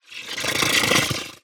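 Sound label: Glyphid Exploder Expand, creature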